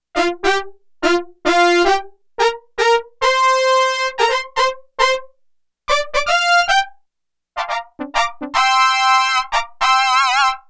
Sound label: Stab Brass, Roland JX-8P